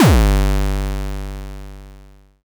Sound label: Bd Hardcore, Kick Drums; Zero-G; Soundclash